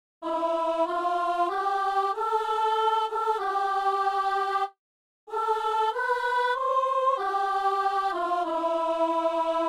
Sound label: soprano; Psaume 90 (91); Messe du 17 janvier à Saint-Denis